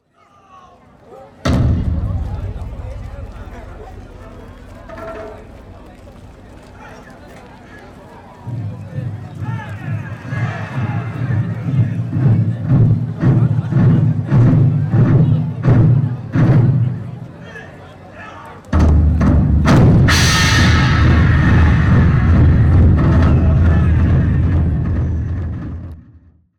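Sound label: taiko drums in unison; Chino Cultural Complex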